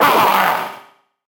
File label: butcher pain; Fight Knight